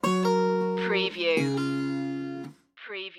blues stinger

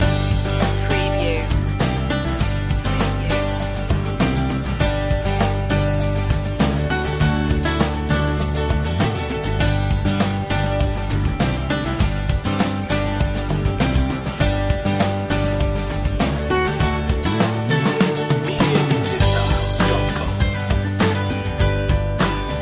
power of now loop

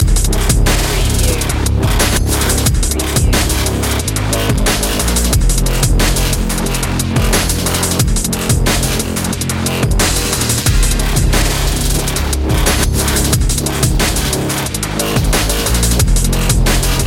underground bass loop

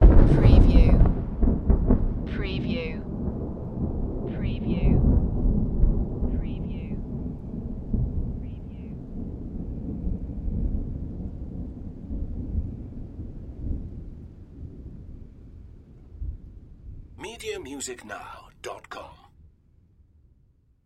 Thunder Crack Roll

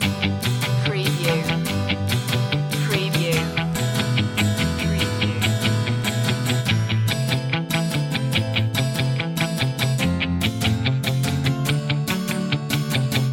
driving in my woody loop

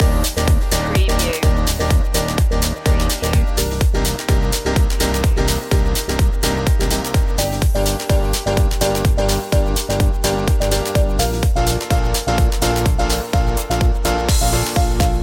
summer clubbin loop

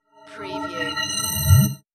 Scifi Production Element - Out There

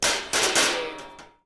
Metal Drag Scrap Clanking

